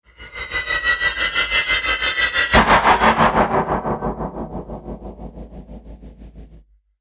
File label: Movie Impact Stutter; Miscellaneous Impact Sound Effects